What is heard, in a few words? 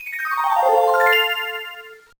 Jingle; The Sims Bustin' Out; Game Boy Advance